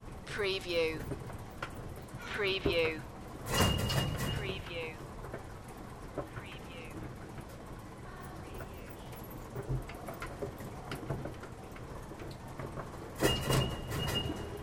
Escalator, Conveyor Bells, Machine Punch, Metal Clunks